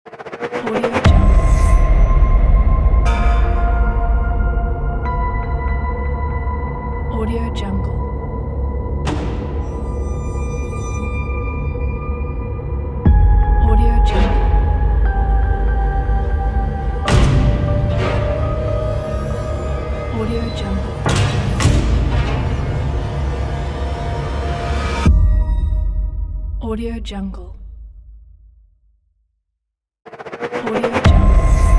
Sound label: horror logo; sviridpavlov